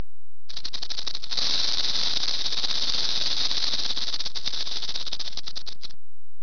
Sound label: rattle; Webster's International Encyclopedia 1998